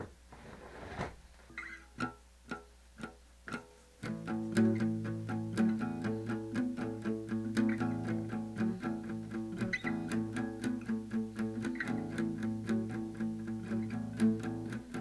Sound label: riff; riffs